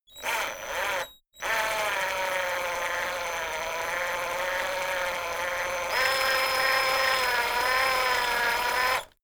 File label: servo move beep